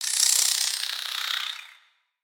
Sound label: Spider Sleep; Lego; creatures mechanical